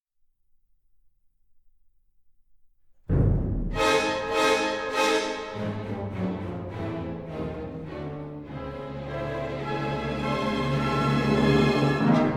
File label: Piano Concerto in D flat Allegro maestoso; Khachaturian